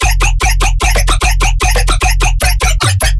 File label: bass loop 150 F; Virtual Riot